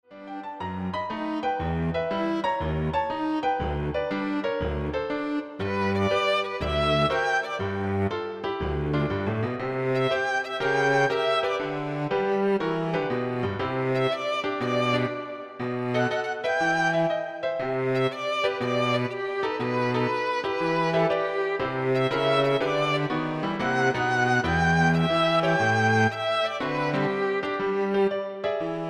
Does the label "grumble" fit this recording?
No